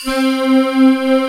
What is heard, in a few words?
LINEAR SYNTH